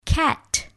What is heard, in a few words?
cat